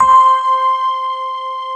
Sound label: Fender Violin, Fender Stack